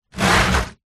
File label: household cool box plastic slide ground